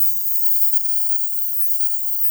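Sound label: hawkshot sparkle loop; LoL; ashe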